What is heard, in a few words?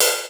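Cymbal; Ableton Foundation FreePacks; Foundation Drums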